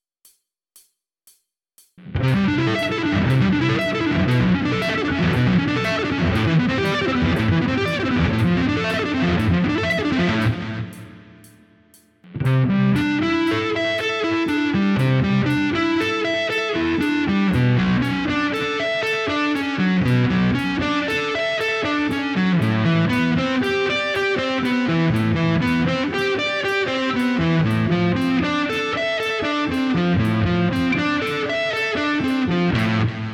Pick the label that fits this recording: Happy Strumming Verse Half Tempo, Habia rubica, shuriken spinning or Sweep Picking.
Sweep Picking